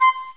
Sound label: pipe